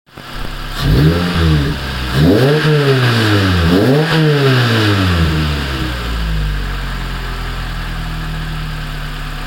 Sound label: engine sound, lotus